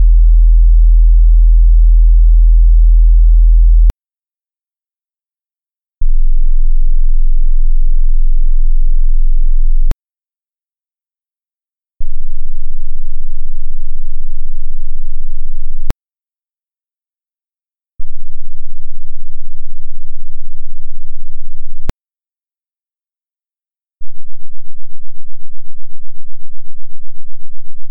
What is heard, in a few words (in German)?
theta abstieg